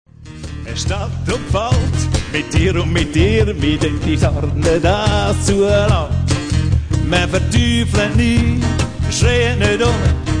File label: Cello